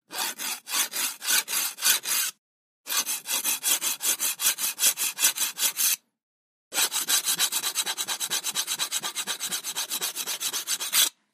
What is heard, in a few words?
Instruments Hacksaw, hacksaws